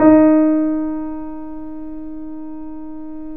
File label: D#, The Piano; InVision Interactive - The Piano; BOESINGSOFT